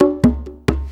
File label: Maraccas, Perc Loops, 4-4 Drum Loops; Drum Tools